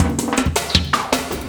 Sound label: LOOP, 160 BPM, Drum & Bass; Ueberschall; Drum & Bass Flash Trackz